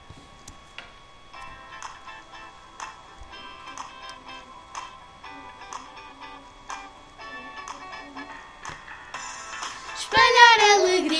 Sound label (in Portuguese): musica natal